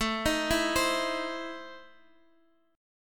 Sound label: E Major 7th Suspended 4th Sharp 5th, A